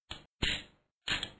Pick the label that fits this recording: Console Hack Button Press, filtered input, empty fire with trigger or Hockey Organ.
empty fire with trigger